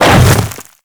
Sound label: Hammer Boss Step Ice, Footsteps